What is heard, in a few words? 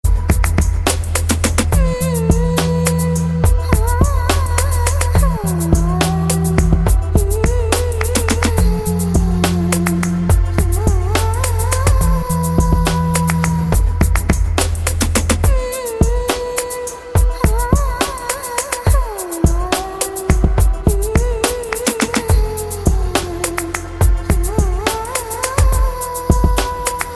Arabic Bass